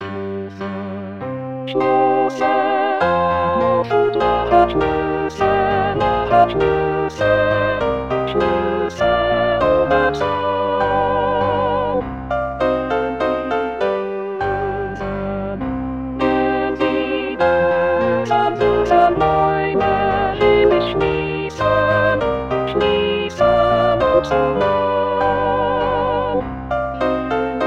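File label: alto; Jubilate; Brahms Waltz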